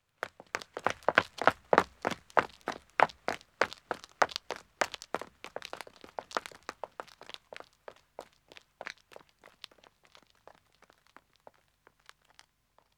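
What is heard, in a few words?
Country Road Running 2 men depart; Footsteps; BBC Sound Effects Library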